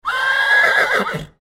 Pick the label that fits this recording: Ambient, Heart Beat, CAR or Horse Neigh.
Horse Neigh